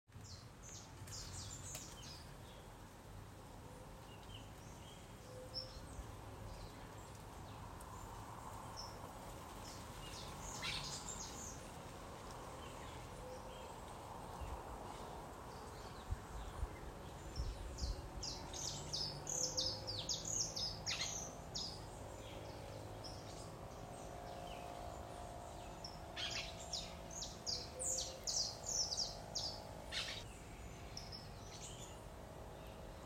saffron finch

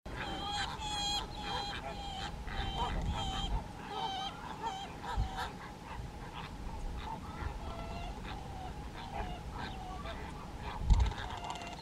crested caracara

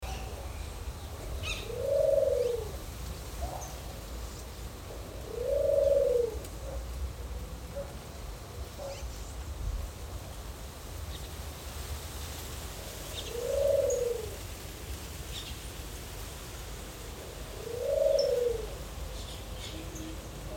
scaly naped pigeon call

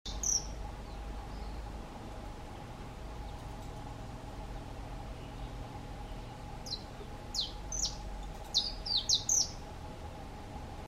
saffron finch

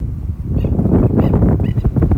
marbled godwit

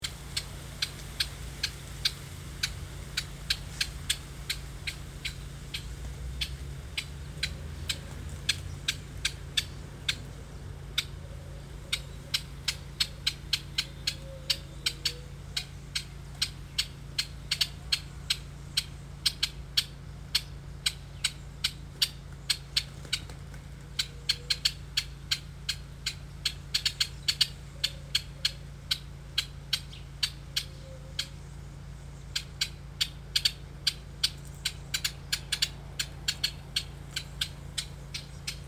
carib grackle alarm call

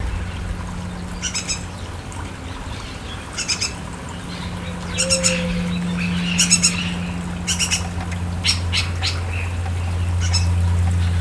begging call juvenile yellow oriole

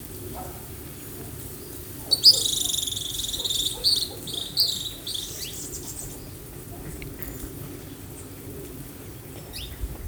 gray kingbird

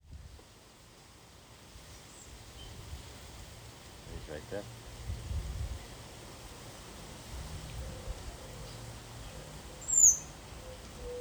pearly eyed thrasher call

